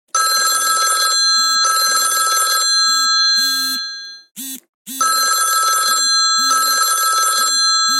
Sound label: samsung classic bell